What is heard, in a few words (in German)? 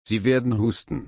husten